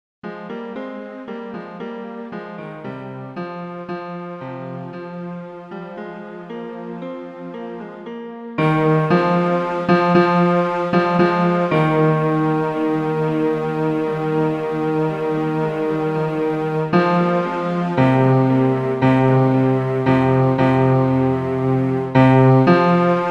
bass; SantyAnna

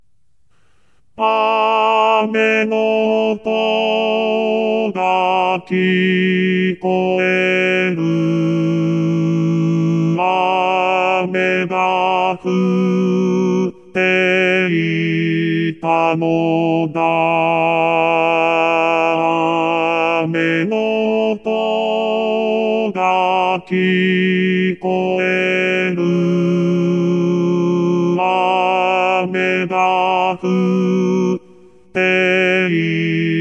ame bari v

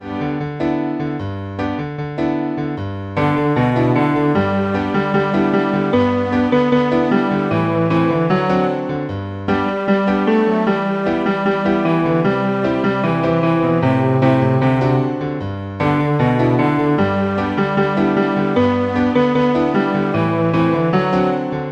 bass

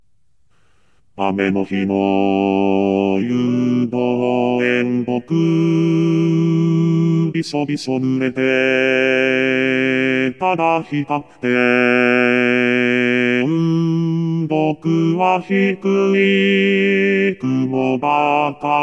yudoenboku bass v

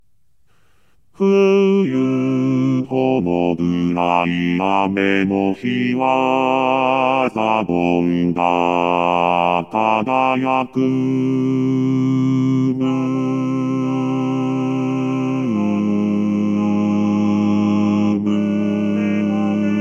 amenohi bass v

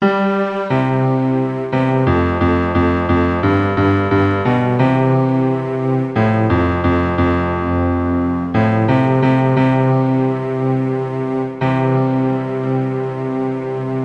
bass

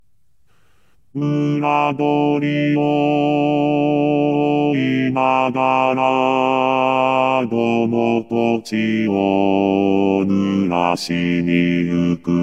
musashino bass v

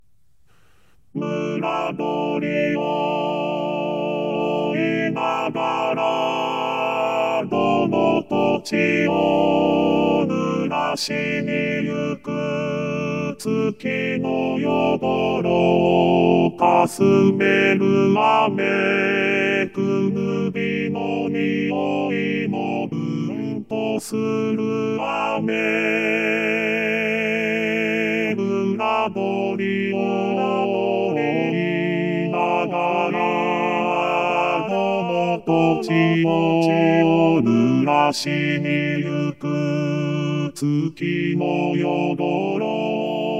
musashino chorus v

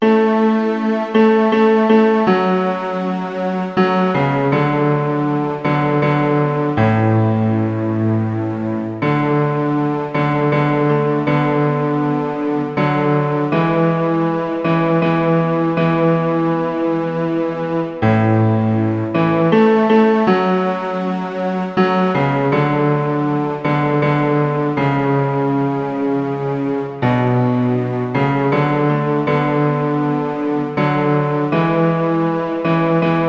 bass

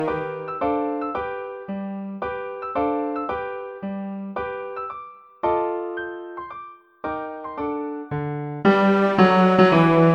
yuki bass

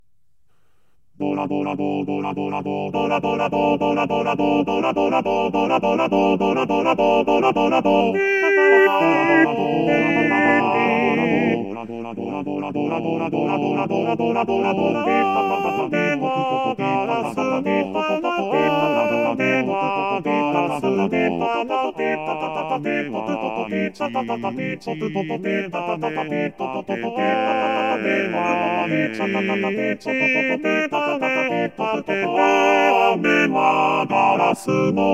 doradorado chorus v

